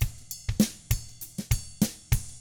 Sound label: funky, drums